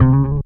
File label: BASS FX; E-Lab; X Static Goldmine 2; akai